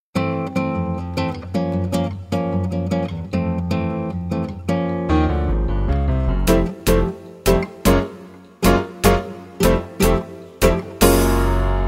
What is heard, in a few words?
jazz time